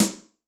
RIM rock, Yamaha DTXpress-II snare

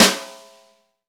SNR, Snare; Ext.Snare; Club-50 - Foundations

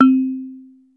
woodbell, Yamaha Motif ES drum machine; 300 Drum Machines; Yamaha Motif ES-Series

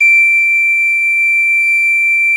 D7, garklein; SoundBytes - Early Patches

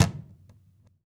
CAJON; Univers Sons - Raricussions

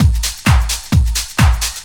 drum 130 trance

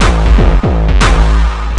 LOW CORE LOOP, Big Beat; Big Beat Samples & More; AKAI; ALL LOOP KIT

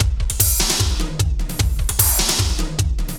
HEAVY, 150; Masterbits - Tekkno Trax; D LOOPS

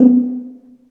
TUBA, Solo Brass, Tuba stacc; Roland LCDP12 Solo Brass; BRS Tuba

